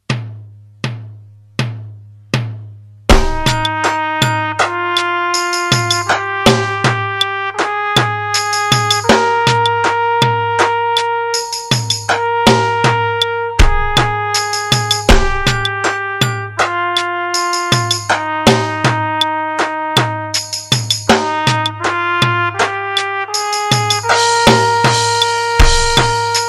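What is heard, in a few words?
medium metronome; aerobics book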